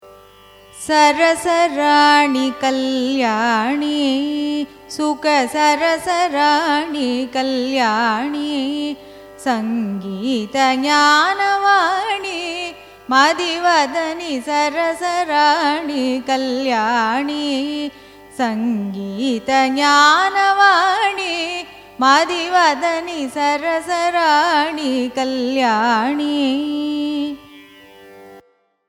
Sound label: raga surutti cine; carnatic music